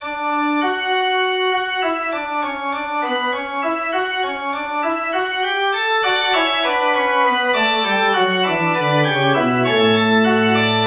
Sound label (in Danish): forspil